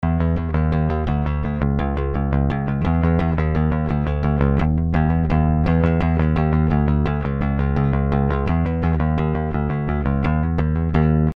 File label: bass; honeycomb bass od